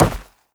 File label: step run, footsteps, player, carpet